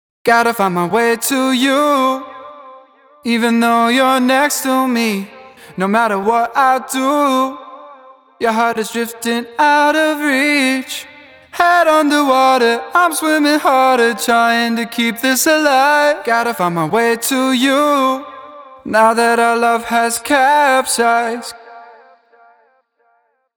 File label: vocal delay reverb